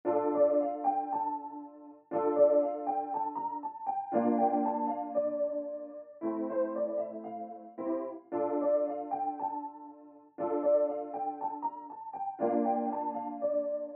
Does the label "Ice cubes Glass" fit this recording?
No